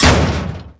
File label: aura close